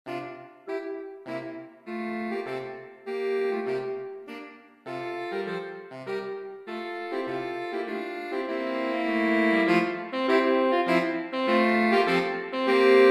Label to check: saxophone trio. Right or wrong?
Right